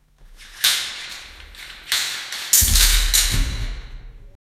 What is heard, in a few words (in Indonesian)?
tirai terbuka perlahan di, theater